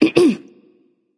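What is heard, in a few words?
clear throat, female; smite; voicepack; hera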